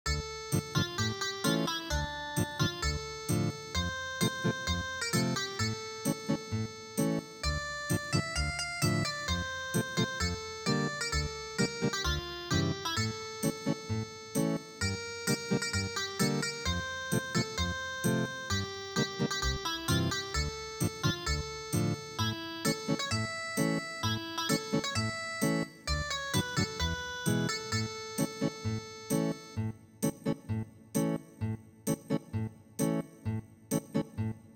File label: Ethnic Guitar, midi traditional